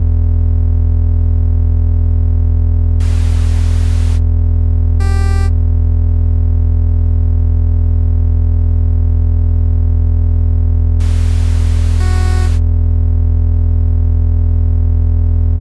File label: spooky; mrpquest